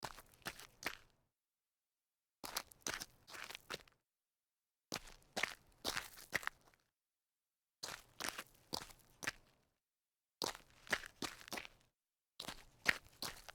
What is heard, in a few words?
sidestep on grit